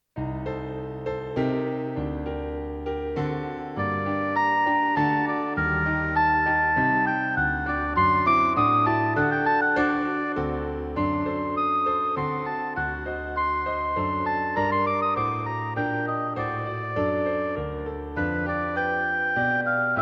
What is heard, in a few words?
A new gentle piece for solo Oboe with Piano accompaniment.; The Piano accompaniment is an important part of the piece.; (Grade 5-6)